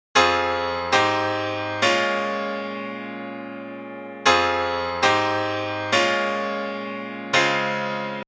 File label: verse chords